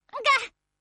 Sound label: Wall Bounce; Noel Vermillion; CTCS